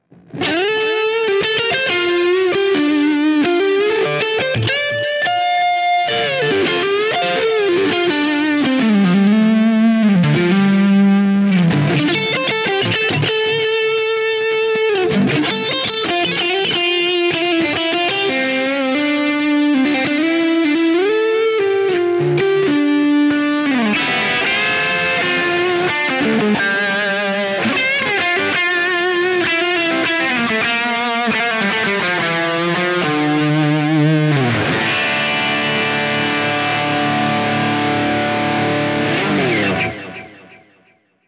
Lead Neck to Bridge Splitted Humbucker; Schecter Rob Scallon

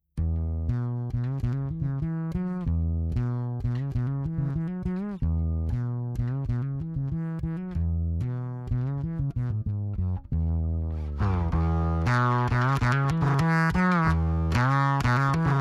BB-1X Godin Blend Bass High Drive

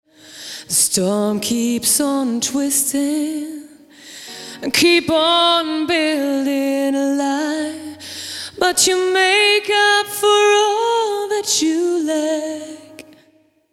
Vocal EQ Vari Tube Hard

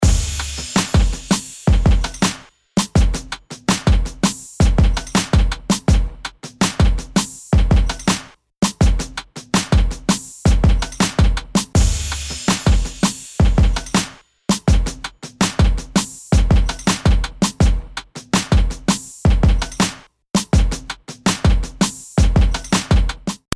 Beat Clean

